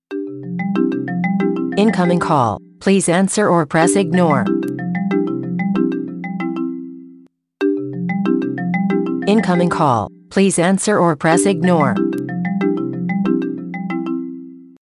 iPhone XS

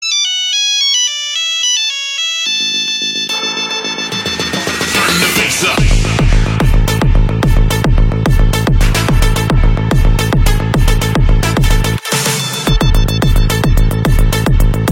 mono bass drop nokia remix

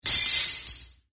glass breaking

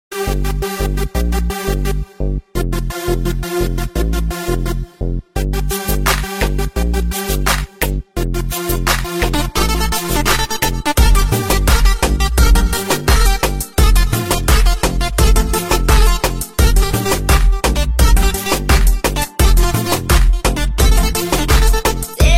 Samsung Galaxy Note 20

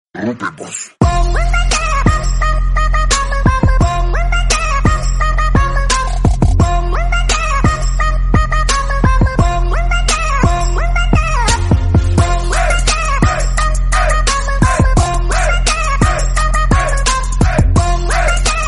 EDM Remix

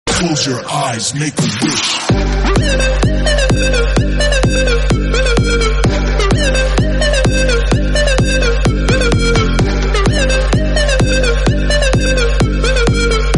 EDM

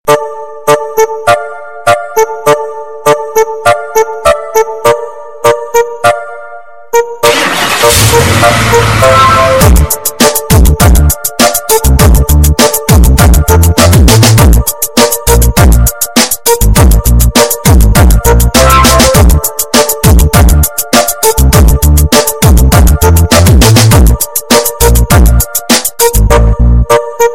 Nokia Purevier 808

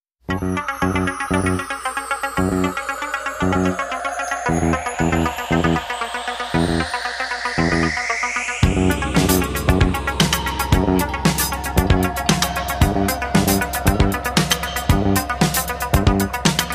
elegant ringtone

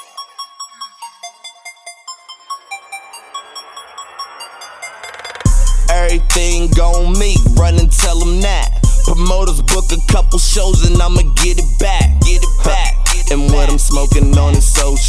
samsung galaxy a03s, dzwonki na telefon; telefon komórkowy

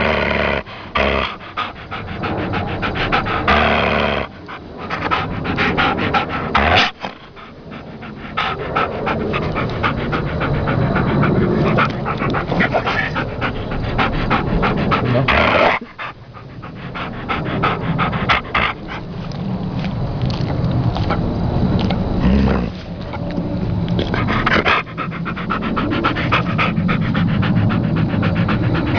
dogs growl

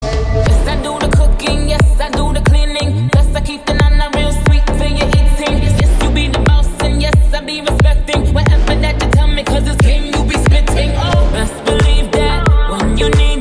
Instrument

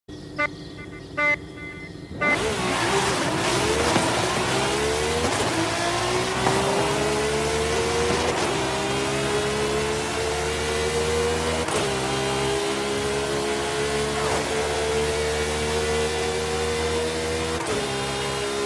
audi r8 v10

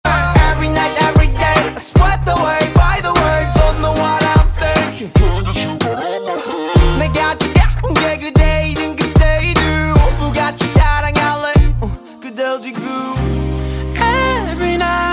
Instrumental

